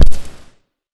catnap footstep; simba garten of banban7

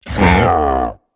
pain, procoptodon; zoochosis pack

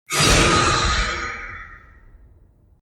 Jumpscare; Dj Meso Man; copycats; PandaClones Jumpscares

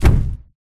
footsteps; huggy nap

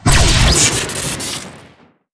main shot crit; superbulk speaker; bison

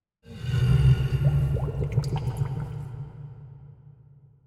SPAWN MONSTER; zoochosis; lazynap pack 2

